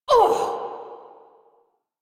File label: Pain, Barks; Warframe; Corpus Lich; Persona D